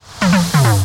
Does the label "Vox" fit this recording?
No